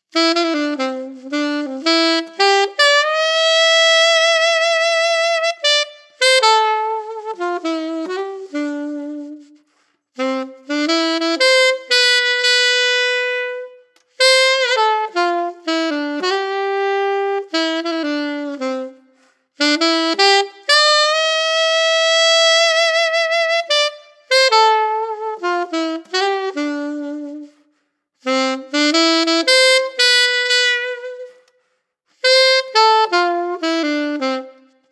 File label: Alto Reverb, alto saxophone